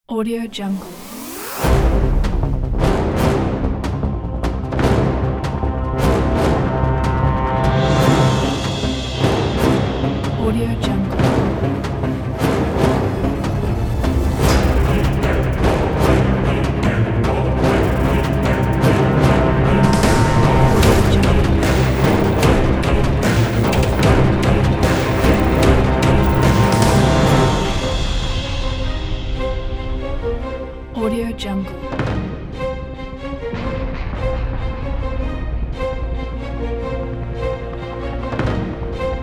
epic hybrid trailer

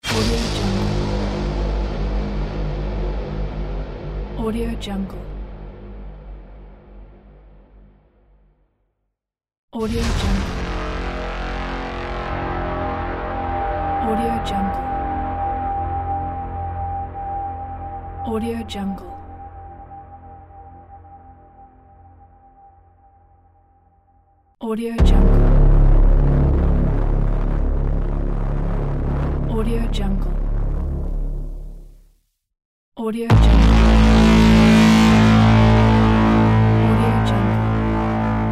transition epic braam hits

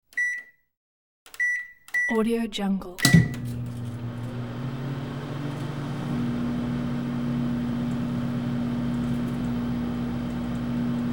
microwave on off with beeps

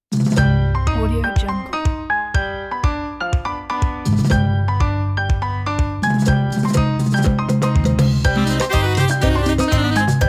latin holidays

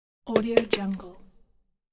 tapping on helmet

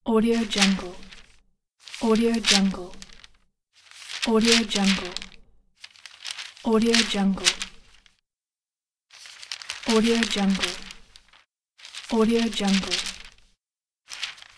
newspaper sound effects

